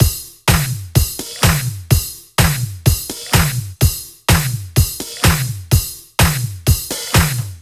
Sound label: 126BPM Cubic Kit; Vengeance Samples